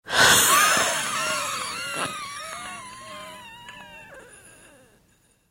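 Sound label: wheezing in a human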